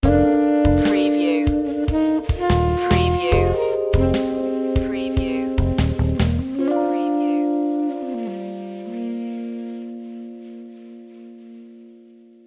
alto summer sting

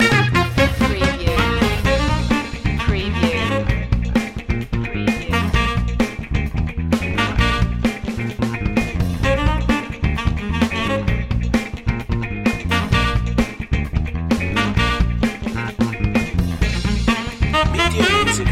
busy funkster loop

